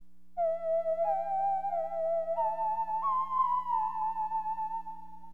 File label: WHISTL, HipHop, Keyboards; LiveAct Pool 1